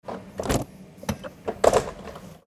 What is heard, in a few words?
train door opening